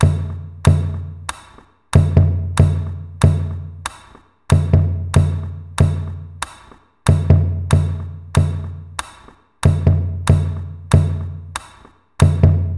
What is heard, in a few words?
Surdo primera